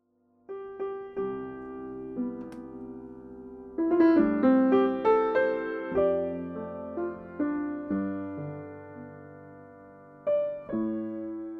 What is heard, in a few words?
Piano